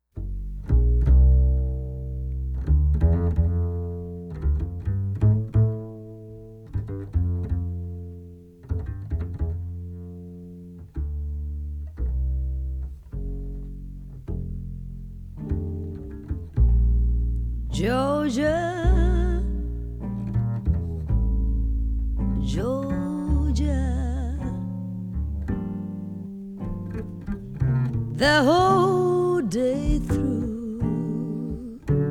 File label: Female Voices; Five Songbirds A Reference Collection of Female Voices